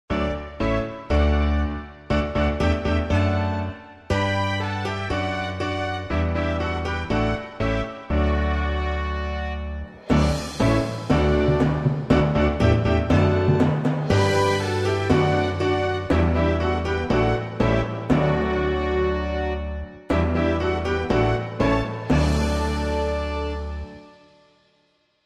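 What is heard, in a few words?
instrumental; Gloire a Dieu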